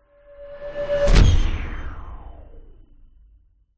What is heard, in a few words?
sound design whoosh sci fi pass hit; zapsplat